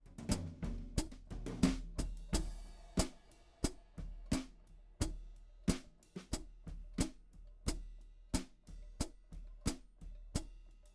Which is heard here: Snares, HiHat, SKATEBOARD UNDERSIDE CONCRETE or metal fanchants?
HiHat